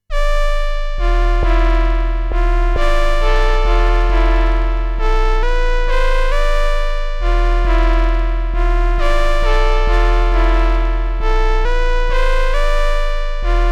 Motor Synth MkII Filter Bass; Gamechanger Audio

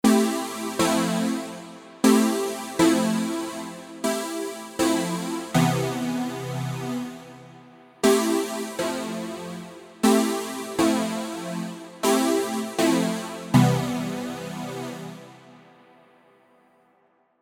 Sin Saw

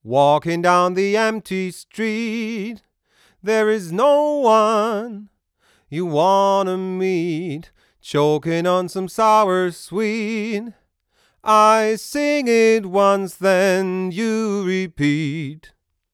Vocal 30 dB 300 Ohm; IGS Audio NE573

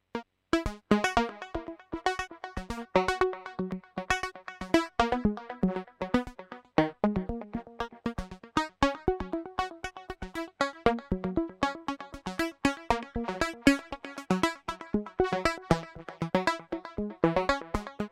Moog Labyrinth Sequence

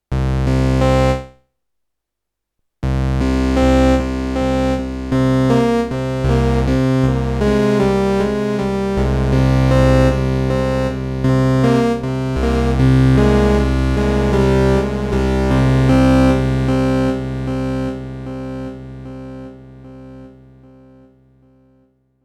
Synthesizer Pan; Free the Tone Future Factory

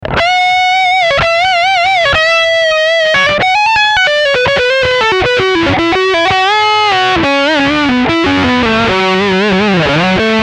Preset Metal Lead

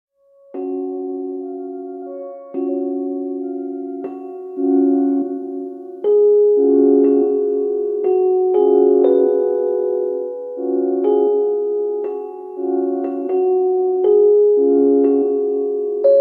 Chill Orchestra; KI MIDI Generatoren AIVA Influence

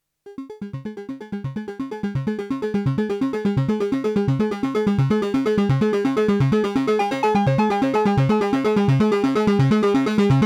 Cascadia Distortion Arp; Intellijel

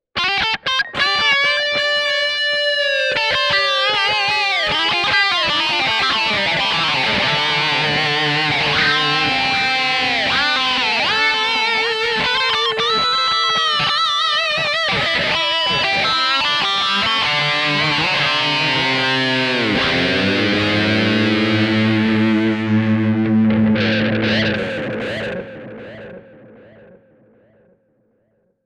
Delay Tape Lead Touch Wah; EQD Silos

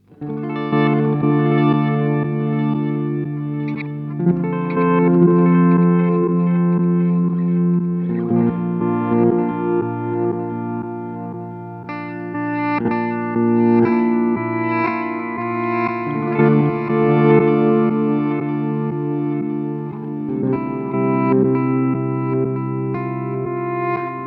Count to 5 Sound; Montreal Assembly

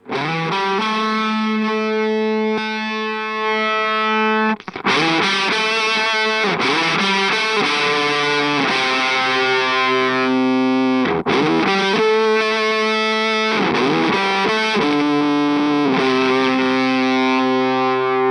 Octave Clang Octave up dark; Death by Audio

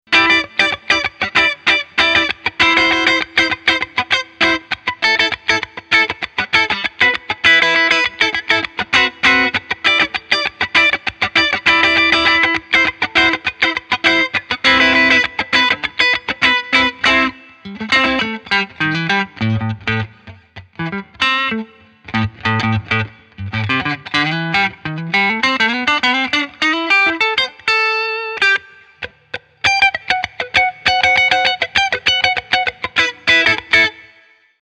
Fender Tone Master FR-10 Twin

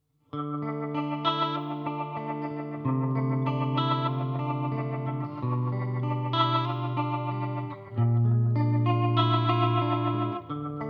Telecaster Rotoverb

